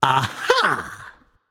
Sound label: nv discover ah; smite; voicepack; ganesha